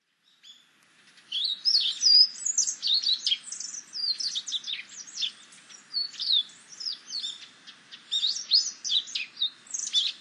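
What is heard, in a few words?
Grey Bunting; kuroji; utsunomiya; koe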